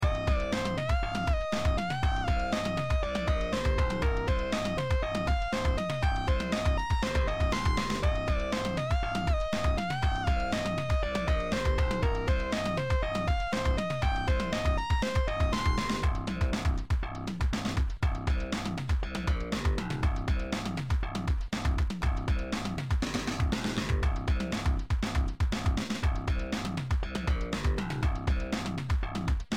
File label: bass basstone lead sound beat